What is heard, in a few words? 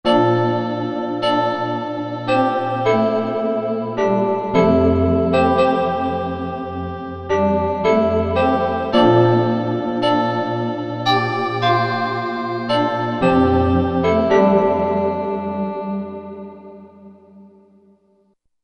Ice Dance, Korg MSC-14S Sound Effects